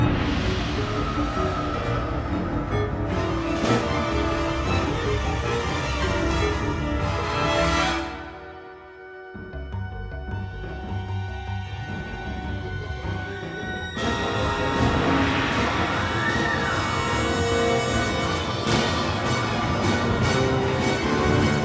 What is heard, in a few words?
music, roof fight; brainiac attack; Lego Batman 3 Beyond Gotham